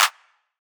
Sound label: Clap; osu